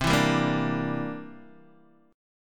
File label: C 9th C down Guitar DADGAD